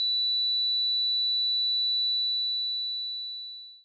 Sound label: ear ringing right